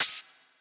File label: Hihat; Ghosthack Advent Calendar Day 4